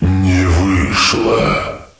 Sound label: woohoo